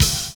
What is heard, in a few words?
DOUBLE BD; Univers Sons